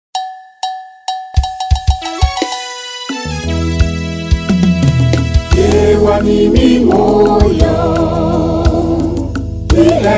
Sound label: ANTHEM, CHOIR; YEWA ANTHEM